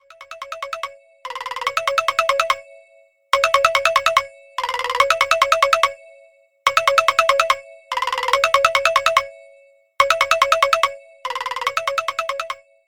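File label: standard signals panasonic fun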